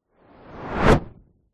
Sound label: whoosh fast; sound design